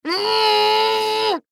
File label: Human Groan; Angry Short Male Ten Yrs Boy; Groaning; Voice; Blastwave; Humans